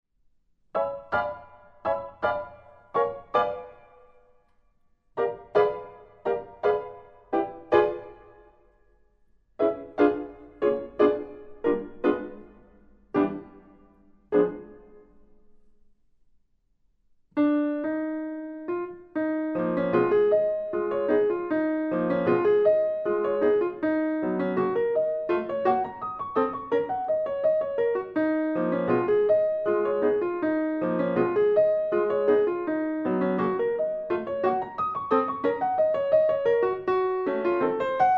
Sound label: Liszt Valse oubliee Pianist